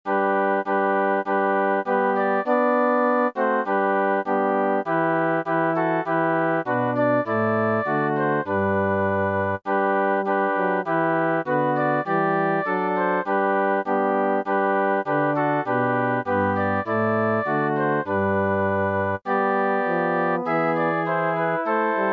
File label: Angels from the realms of glory SATB